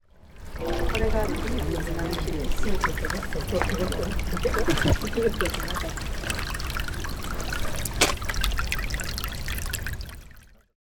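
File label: murmur of a stream; 小川のせせらぎ; うちのネコは まる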